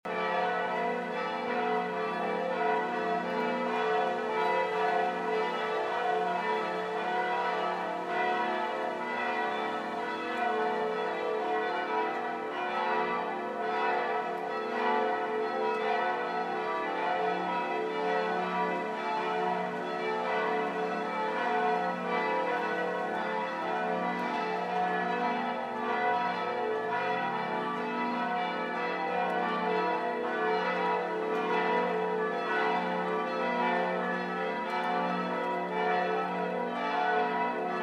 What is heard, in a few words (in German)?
Glockengeläut Lutherkirche; Hof